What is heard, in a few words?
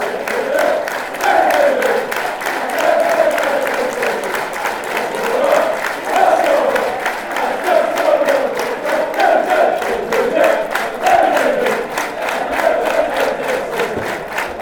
fast; david nugent